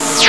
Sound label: teleport; Odyssey